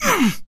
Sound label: Melee Grunt, Human Male